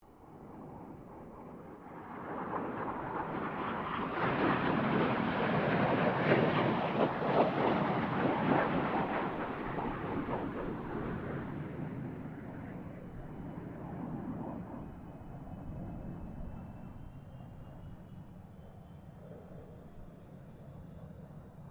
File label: AIRCRAFT CA-27 SABRE PASS DISTANT; CA-27/F-86 Sabre; Airplanes; Vehicles